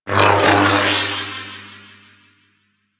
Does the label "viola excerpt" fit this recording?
No